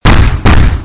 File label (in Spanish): Caminar; Scorpion CSO